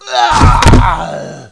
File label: death; Alien Arena; hunter